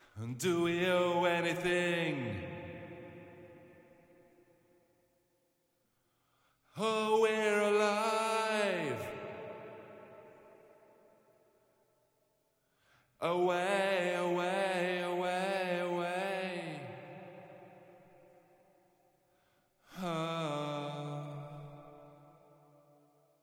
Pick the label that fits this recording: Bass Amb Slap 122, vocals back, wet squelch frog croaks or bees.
vocals back